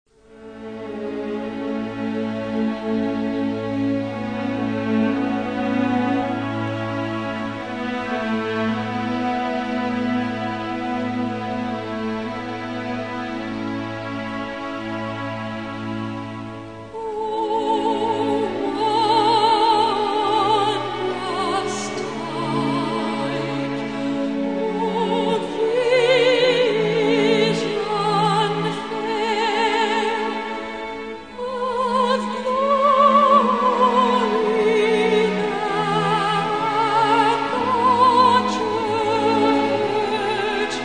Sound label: Philharmonic Orchestra Choir, O Wondrous Type And Vision Fair